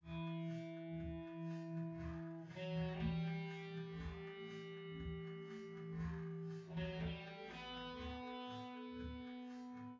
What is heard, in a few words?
wdrums